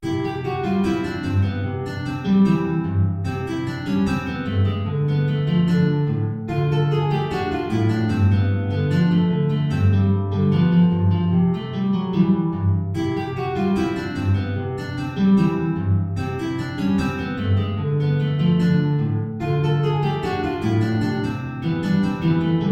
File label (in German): 2 Gitarren